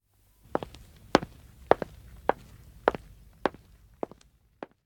Footsteps Wood Walk; The 39 Steps

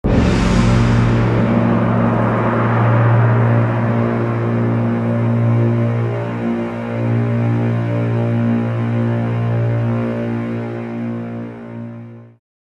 Shot Crash; hulk gc ps2 xbox gamerip 2003; Banner

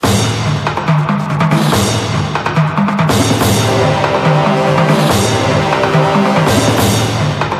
jingle map

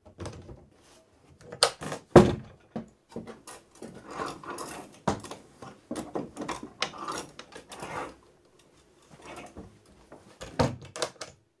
Wardrobe searching; the 39 steps

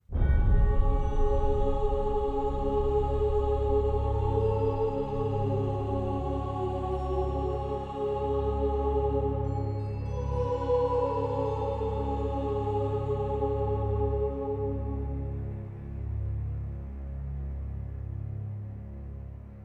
Shock; Pillars of Eternity

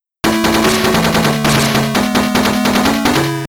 Level Complete; Pang; Buster Bros; Gameboy

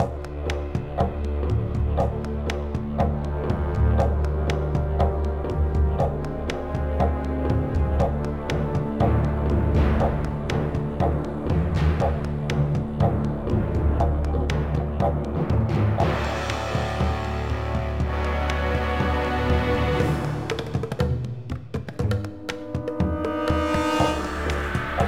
Jungle Idle

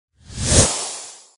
attack; endless world idle rpg gamerip 2019

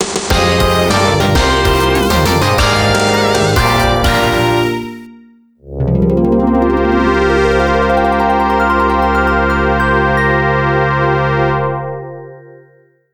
All Clear; Castlevania: The Adventure ReBirth; Wii

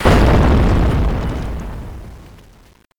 Explode Long; Learn to Fly 3

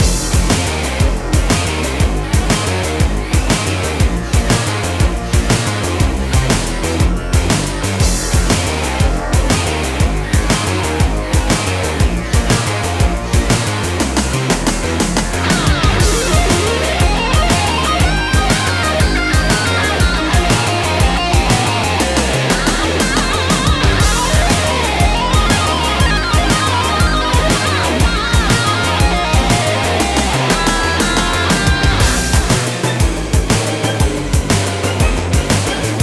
BOSS Krang Loop; Teenage Mutant Ninja Turtles Shredder's Revenge

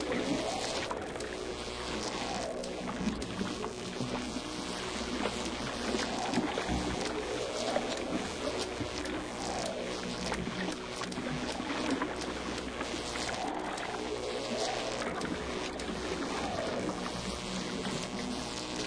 Ambient - Lab; 3 Days: Zoo Mystery; Windows

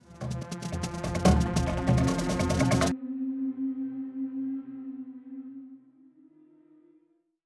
Music transition music segment; Music Hideout2

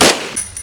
AK47 SNOW; blockade classic

